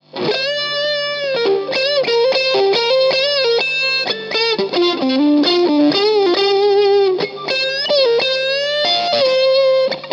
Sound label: drive lead